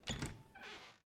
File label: Scarf Open Check; Game rip; MiSide